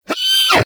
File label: Snow Critter Vocal Pain; Hunting Critters